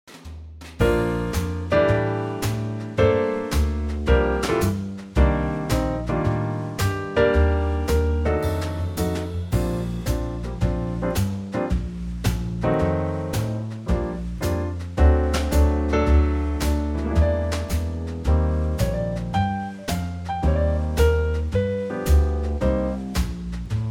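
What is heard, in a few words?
trio B flat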